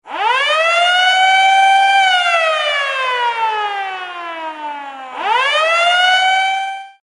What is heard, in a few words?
Strong Wake Up Alarm